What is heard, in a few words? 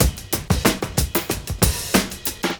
DRM CHOP 93; AMG Gota Yashiki Groove Activator